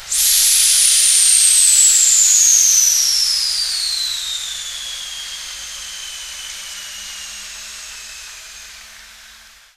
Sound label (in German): bremse loesen, GT6M; Vehicles